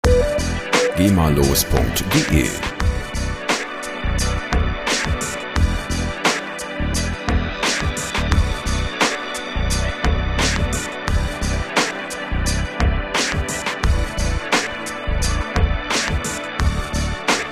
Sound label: chronic beatz